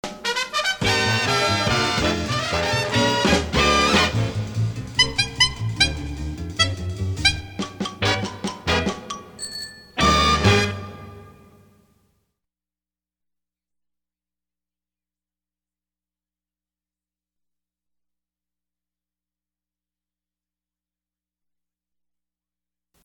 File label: Horn; Pams